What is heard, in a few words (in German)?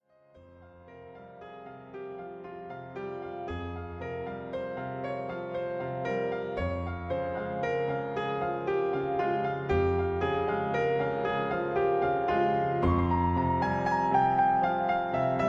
Schlaflied